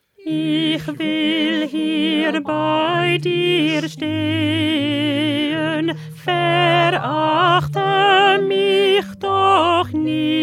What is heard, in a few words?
Alto; Bach; St Matthew Passion